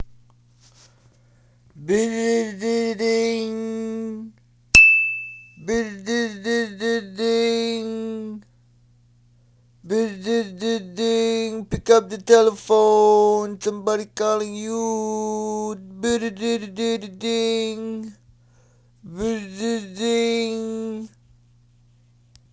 Drunken Ring

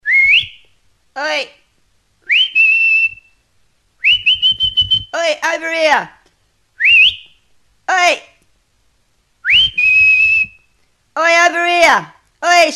Whistle Ringtone

